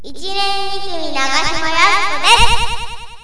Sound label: echo